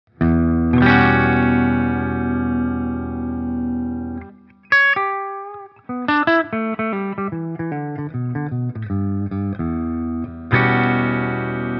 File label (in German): Gretsch G2622T Clean Steg